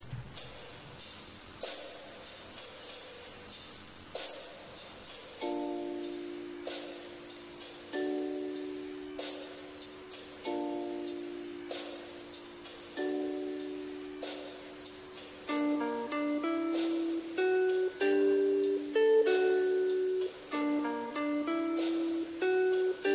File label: taiko